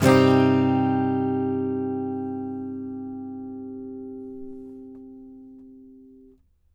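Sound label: acoustic guitar C minor down, right; Country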